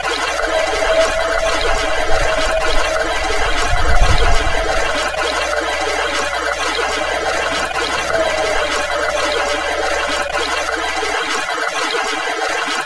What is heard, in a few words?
ambience